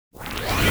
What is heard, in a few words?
noise free techno warm reverse; navigation button fx; nova sound